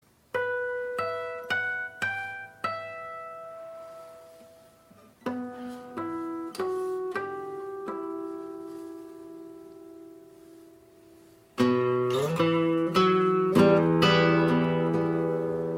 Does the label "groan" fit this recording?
No